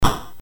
BASIC sid MOS6581R4, GAMES; Sword of Fargoal

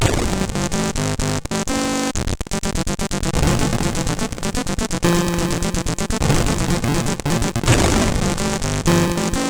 Intro, sid CSG8580R5

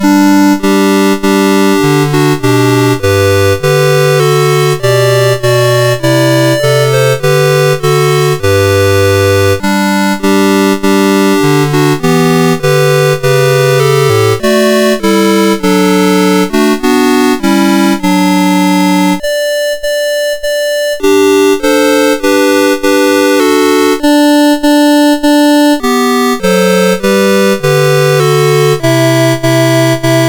Hark the Herald Angels Sing, MOS6581R4

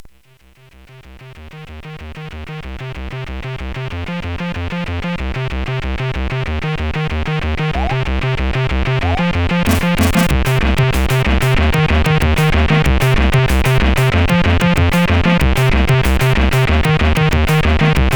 Tekkno, CSG8580R5; hvsc

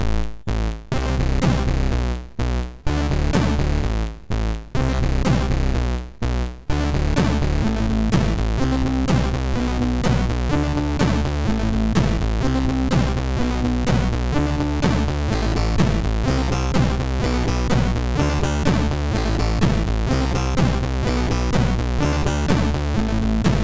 Bad Vibes, MOS6581R2; sid

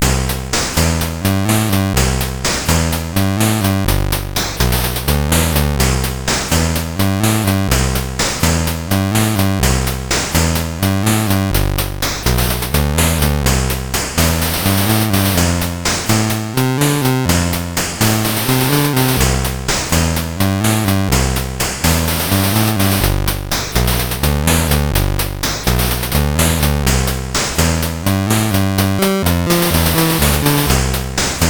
CSG8580R5, GAMES; Street Beat

